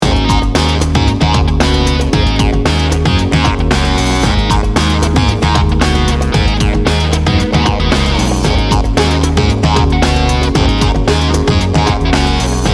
bright and bouncy

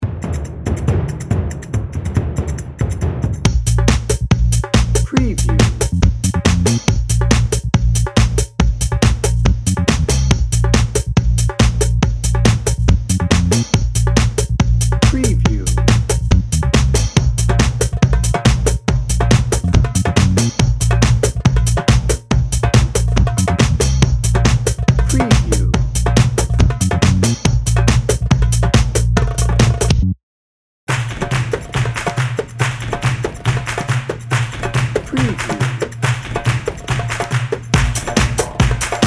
bollywood bash dnb

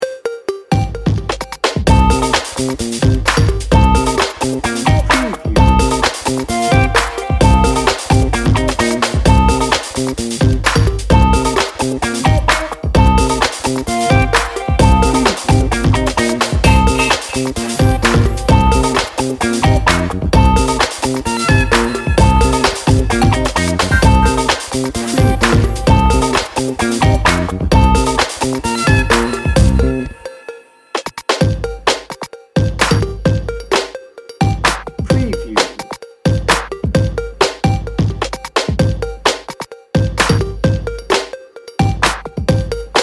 good time funk

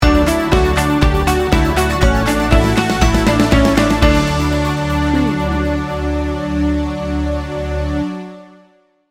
happy glow upbeat positive sting bumper

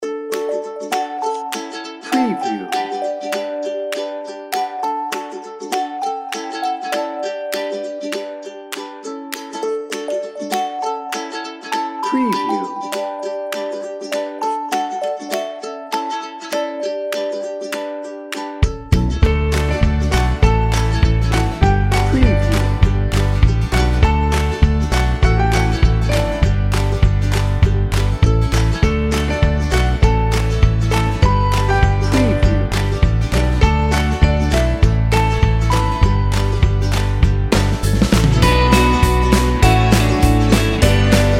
sweet happy upbeat folk pop

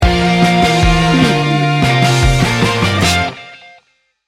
road trip sting bumper

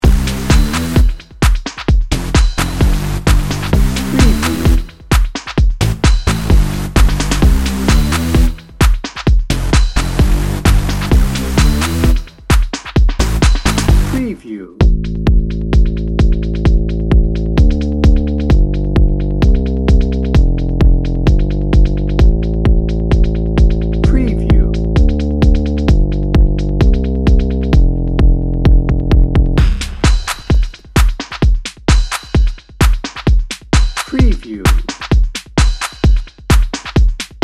beach jam dnb

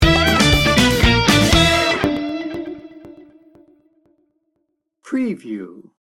funky toes sting bumper

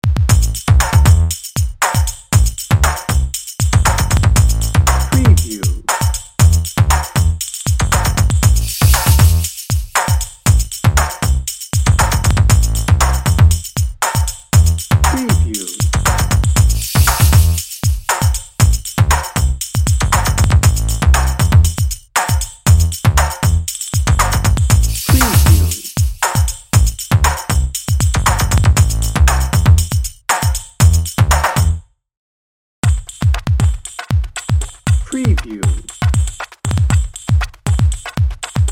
hip and groovy

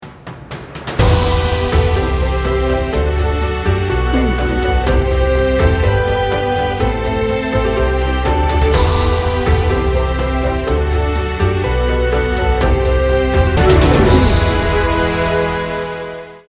travel quest

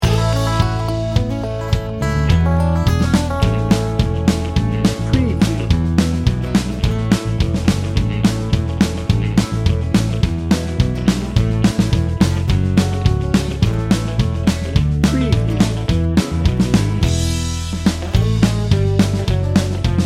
country hoedown bed

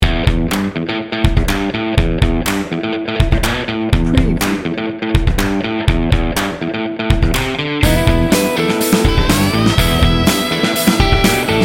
bouncy funky rock

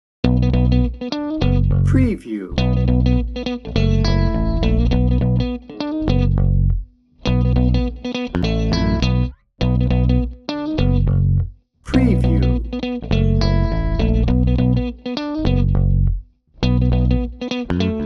funk n brass guitar bass percussion

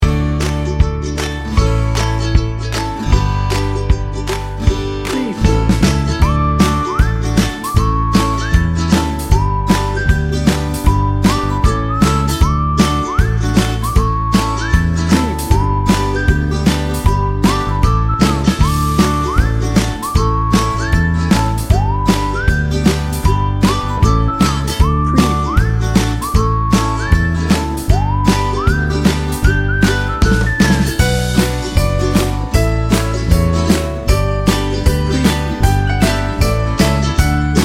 ukulele whistler

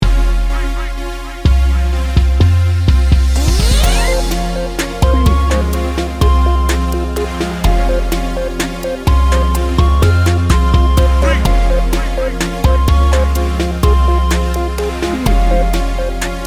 smooth chill

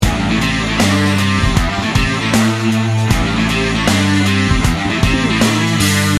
slow fist loop

